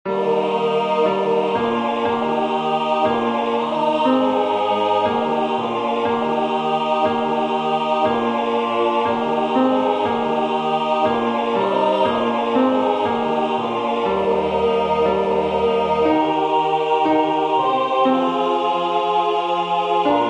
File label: tenor; Alta Trinita Beata